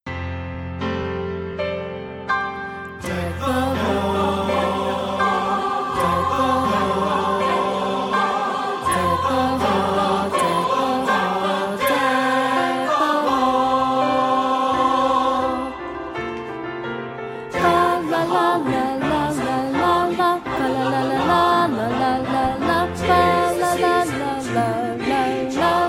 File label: Bass; Coro Juvenil; himno